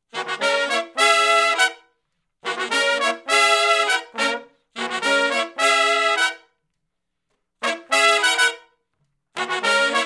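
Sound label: R&B Riff (F#) harmony, Horncraft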